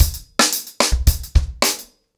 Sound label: 110BPM, ACOUSTIC DRUMS